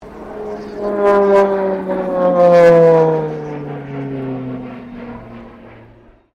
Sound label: PC-9A pass, PC-9 Military Trainer; Airplanes; Vehicles